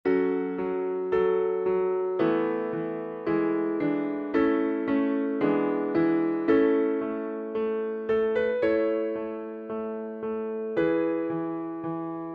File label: music soprano accompaniment